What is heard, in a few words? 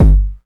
BD, Dance Mega Drums; Best Service